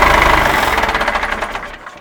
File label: tractor stop; vehicles